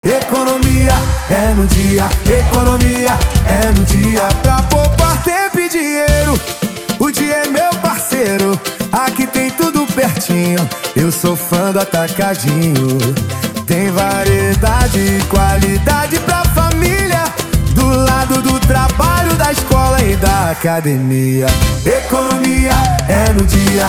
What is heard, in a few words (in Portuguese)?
Jingle